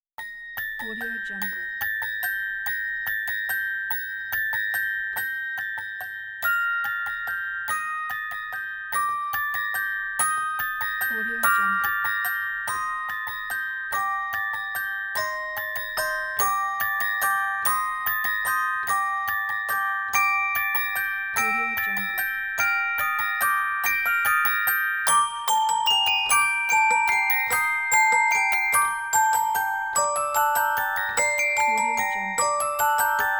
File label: music box